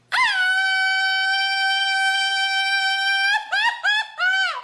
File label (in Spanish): Grito mexicano